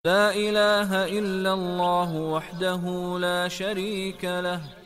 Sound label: Islamic